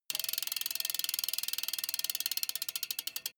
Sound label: bmx bike freewheel push and slow down short